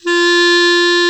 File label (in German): KLARINET G 2, CLARINET